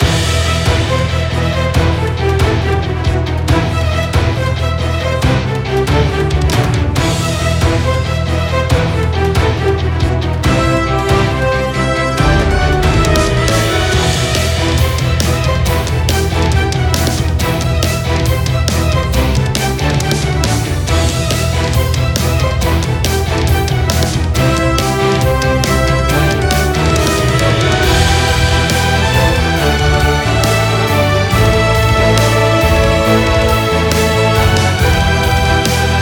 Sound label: Epic Battle loop